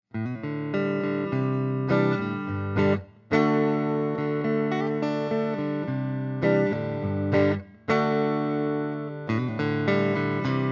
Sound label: 50R neck